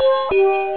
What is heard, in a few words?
Chime